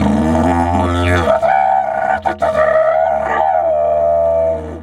F3 WILD, Didgeridoo; Big Fish Audio - Didgeridoo and Other Primitive Instruments

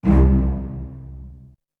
Strings; ZERO G - Datafile DISC 3

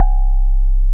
TINE; Wizoo - Powered DX; DIGIJAZZ

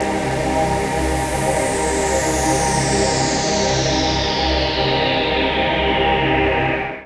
VOICEPAD; Best Service; XX Large Pads; Sample CDs

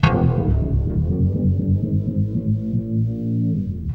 REV, Guitar; East West; Steve Stevens Guitar Samples Collection

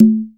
MD CNGA, CONGA; AKAI S6000 CD-ROM - Volume 5

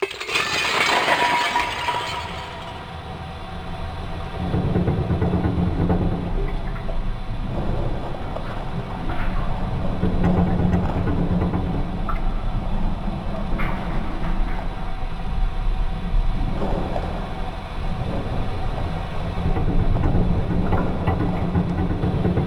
SUBSEA, ATMOS; AKAI Anime Sound FX

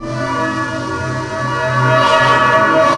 Atmossphere, Ambient; Zero-G Jungle Warfare 1, 2 & 3; 90 s Sample CDs

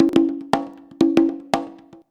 CONGA 119, Modern Percussion Loops

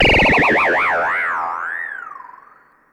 Ring mod FX

